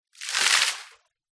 nature water throw from plastic bucket onto deep water surface splash